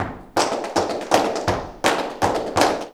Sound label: JALEO TAP, Flamenco